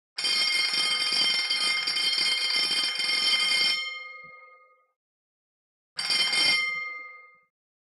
Alarm Bell Short Long

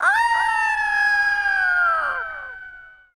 Eagle Screech Echo

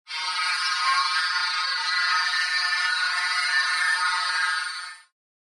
Scanner HF Elect Scan

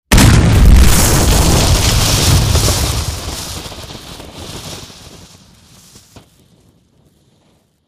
Demolition Charges with Debri

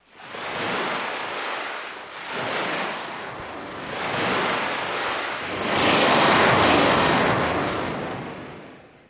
Wave Swells Washing, surf

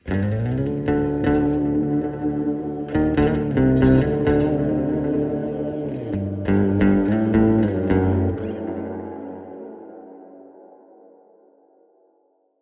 Electric Guitar Low Tone

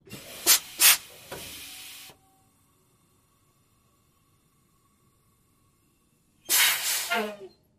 Truck Semi Air Brake; brakes

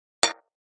Button Echoed Metall

